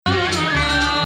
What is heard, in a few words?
Pseudo Hijaz